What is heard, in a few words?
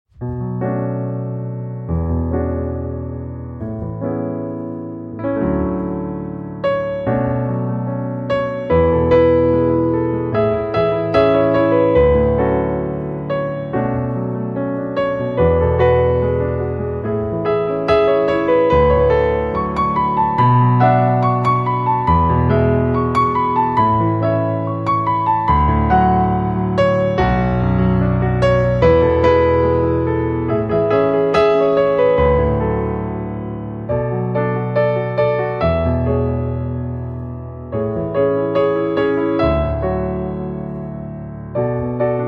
Piano Version